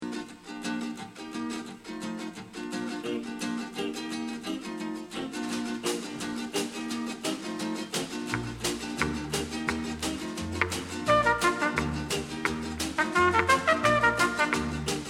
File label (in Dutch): afrikaan beat fragment